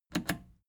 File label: plastic button scratch smooth click disable fast